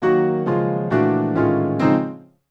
Piano, Acoustic Pianos; Sonic Foundry (Sony Creative Software); Mac Money

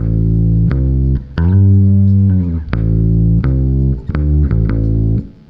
BASS, BASS GTR

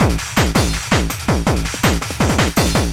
LOOP, Eurotech; Sounds Good

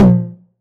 909 Tom Sweet; Goldbaby Tape808 & Tape909; The Tape 909

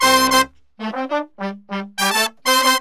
BRASS SAMBA; World Grooves; Best Service ProSamples vol.14; AKAI

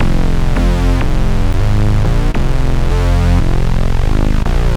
Bass, Synth Bass Loops; Sonic Foundry (Sony Creative Software); Groove Elements

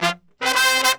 brass 110 G, brass murga; Best Service ProSamples vol.14; World Grooves; AKAI

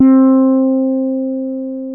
FRTLS C4, FRETLESS; InVision Interactive; Lightware VOL-1; Instruments & Percussions

